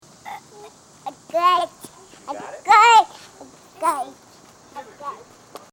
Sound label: 6 month old babbling